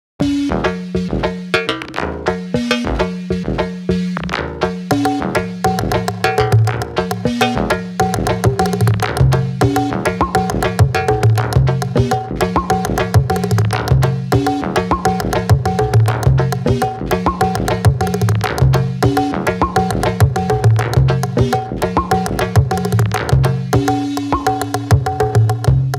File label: three instances congas